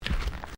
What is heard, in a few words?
Footsteps Ceramic Tiling Step